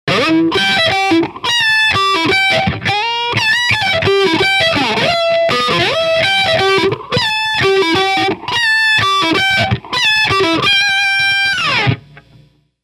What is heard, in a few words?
Strum Latch, toggle